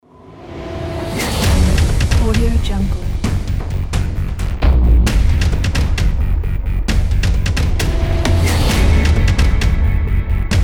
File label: tense escalating cinematic trailer